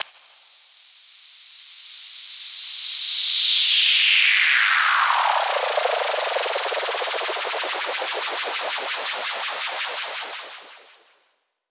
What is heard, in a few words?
FX Downlifter; Mutekki Media; Vengeance Electroshock Vol.2